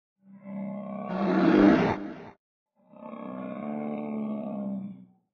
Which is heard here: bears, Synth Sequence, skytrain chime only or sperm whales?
bears